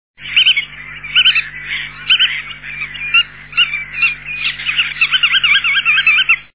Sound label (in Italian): pittima reale